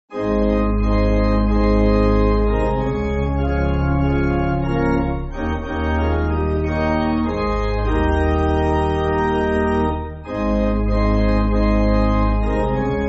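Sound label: Pipe Organ, Backing Files for Churches; Anglican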